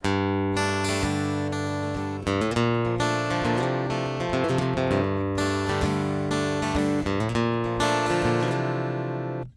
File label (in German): folk, Gitarrensounds